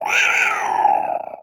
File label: Animal Mammal Carnivore Cat Puma Roar; animals; AdobeCC